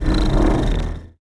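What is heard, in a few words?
equip element; cstrike; valorant; araxys operator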